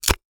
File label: Horror Scary Bone Break Quick